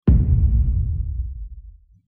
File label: Explosion Transition